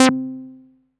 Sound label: SYN, Drum & Bass, 175 BPM; Ueberschall; Drum & Bass Flash Trackz